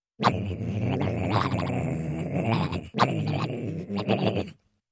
Little monster growl

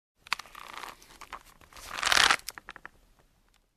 Turning crackling page

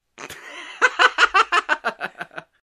Man Hysterically Laughs

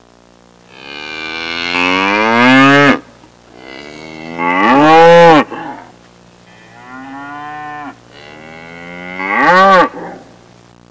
Farm cow mooing sounds

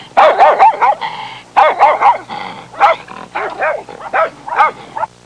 Dogs barking and snarling

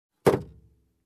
Closing Fuel Cap

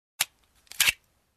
Pistol magazine eject

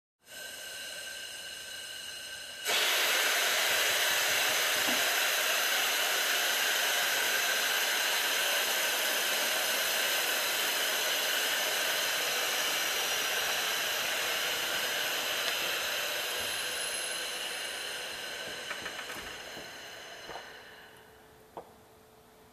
Pressure Washer

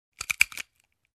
Pepper Shaker

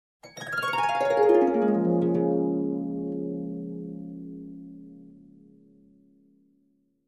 Harp Glisssando down

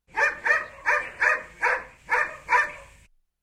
Dog barking at medium distance

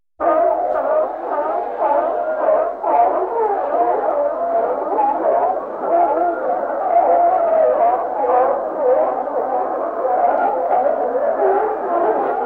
Seal colony barking

